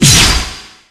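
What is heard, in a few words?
Battle Ax Critical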